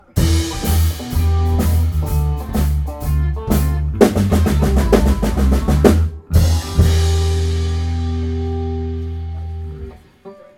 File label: Blues endings